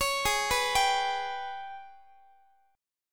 Ab Minor Major 7th Double Flat 5th, down, Guitar; arpeggiated; AbmM7bb5; x,x,16,18,15,18

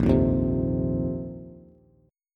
A sharp Suspended 2nd Suspended 4th A sharp, Bass; strummed; A#sus2sus4; JGuitar

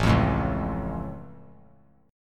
A Augmented A down; strummed; JGuitar

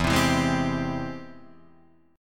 G sharp Augmented Major 7th, chord

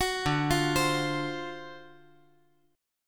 D 7th Sharp 9th D down, chord

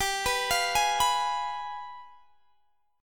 G 7th Flat 9th, Guitar, Standard; arpeggiated; JGuitar

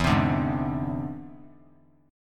E Augmented 7th E down; E+7 strummed; JGuitar

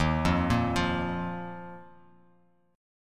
Eb Suspended 2nd; arpeggiated; chord; 11,8,8,10; JGuitar

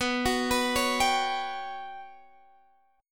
G Major 7th Flat 5th

